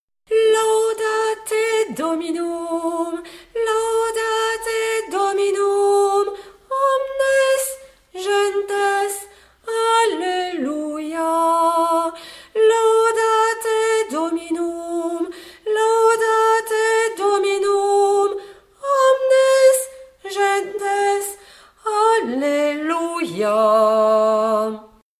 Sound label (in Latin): Laudate Dominum - B, Basse